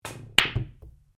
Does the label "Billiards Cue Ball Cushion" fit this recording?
Yes